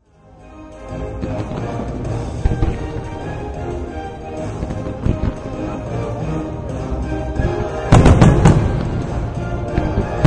Fr Wrk Or Finale, Firework show with orchestral music in background.; Firework Finale with Orchestra 1; Chinese New Year